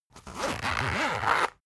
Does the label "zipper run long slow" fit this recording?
Yes